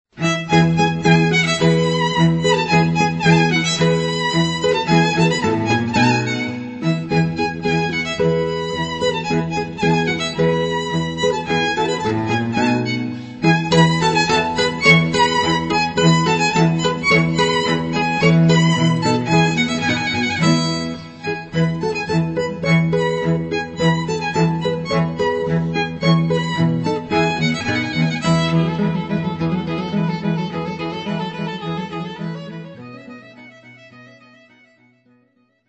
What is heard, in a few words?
Guitar Cello Violin Trio